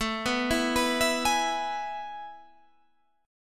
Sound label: A Major 7th Suspended 2nd, Guitar, Standard; AM7sus2 arpeggiated; 17,14,14,16,17,16; JGuitar